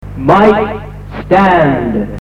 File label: jingle; Mike Stand; MAR 1386 AM & 105 FM